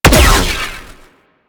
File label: Shocker Fire; Spec Ops